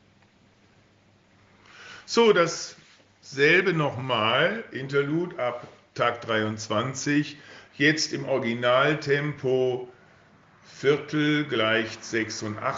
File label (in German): Engel Alt originaltempo; Uebedateien